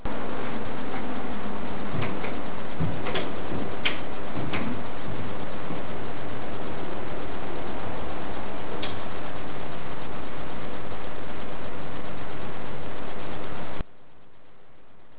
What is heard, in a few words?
dig footsteps